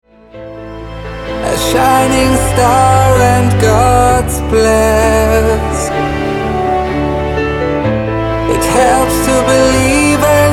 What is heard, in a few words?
Heavenly Orch